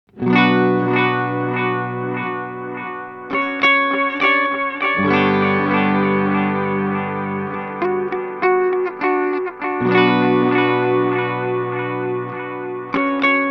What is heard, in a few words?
EHX Pico Rerun Saturation 13h med Modulation